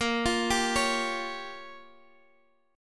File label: G Minor 7th Flat 5th A sharp